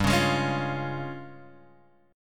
Db Major G; strummed

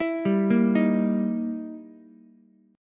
E Minor Major 7th Flat 5th, E, down, Ukulele; arpeggiated; JGuitar

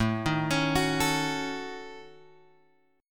A 6th Flat 5th, guitar; A6b5 Chord {5 6 X 6 7 5} arpeggiated

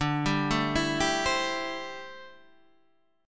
D 9th Suspended 4th D down Guitar Standard; arpeggiated; JGuitar

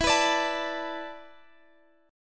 E 7th Suspended 4th E down, guitar; strummed

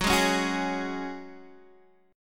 F sharp Suspended 2nd, Guitar, Open D; x,9,6,7,9,6; down

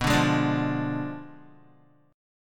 B Diminished 7th B Guitar; Bdim7 strummed; 6,5,8,7,5,x; JGuitar

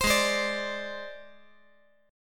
G sharp Major Flat 5th, chord; G#Mb5 strummed; JGuitar

